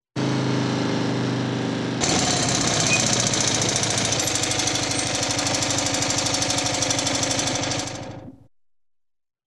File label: traffic